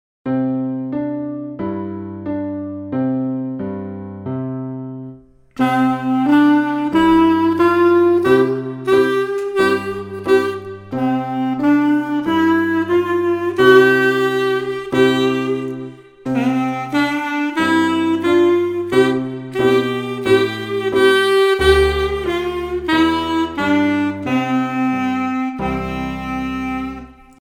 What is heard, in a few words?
Clarineo